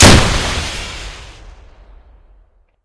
rpg fire; Weapons; Guns

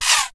arrow fly

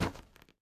asteroid, Footsteps

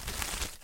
unwrap

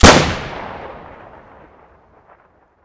Kar98k, Guns Fire; Weapons

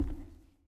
floor, Footsteps; civ13; civ14

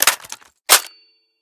AR15 Reload; Weapons; Guns Interact; Civ

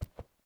tile, Footsteps; civ13; civ14

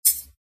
wirecutter; Items

